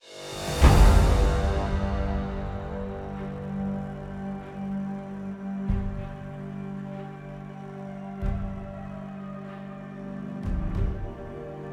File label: music draft finalization; champ select